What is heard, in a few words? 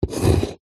foley wood scrape sharp knife score single longer; zapsplat